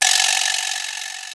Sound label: Quijada, Roland TR-727, Drum Samples